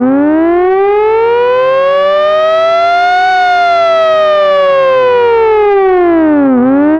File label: vintage hi lo siren